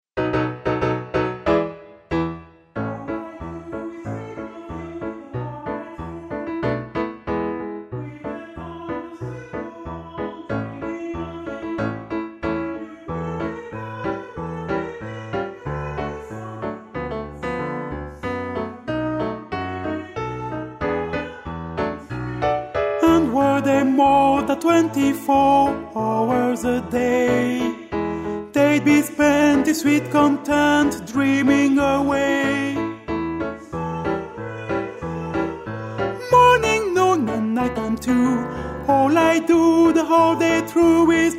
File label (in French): LENT ALTO